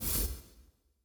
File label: news table in; Great Book Of Magic Deluxe; Wazdan